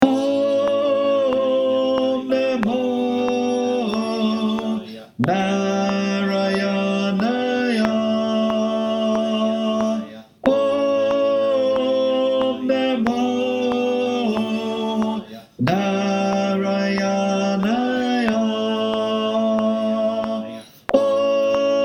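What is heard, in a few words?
Tenor, choir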